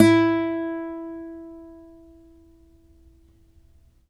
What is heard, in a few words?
ACC FG E, Acoustic Guitar; East West; Steve Stevens Guitar Samples Collection